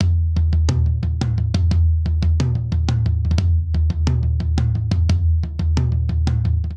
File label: Straight Five Rock Toms Only 5/4 177 bpm; Ableton; Factory Packs; Drum Booth